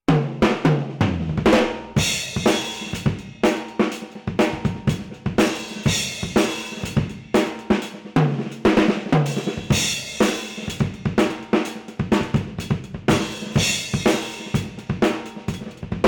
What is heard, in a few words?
Solo Drum; olofonia